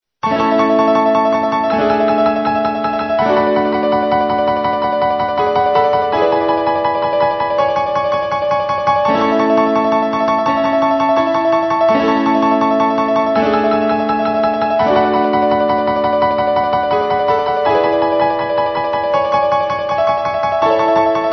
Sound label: Aeolian Piano Roll